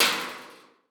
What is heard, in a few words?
Trash Can, Alesis D4